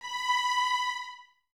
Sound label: Violins Detache B 5; Miroslav Vitous; String Ensembles